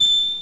Triangle

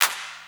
Snares, Clap n Snap

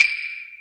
cards, Percussion Ticks; Misc Percussion

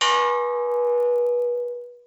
borng, Bells

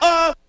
uh; ooh ahh

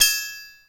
tang, Bells

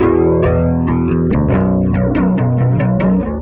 guitar, funky drum loops

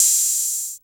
Hats Electro Open

